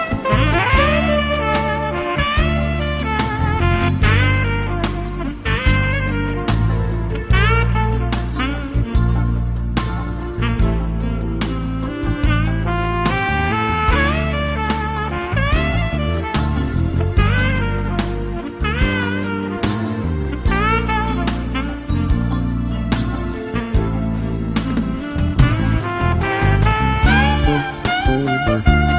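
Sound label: instrumental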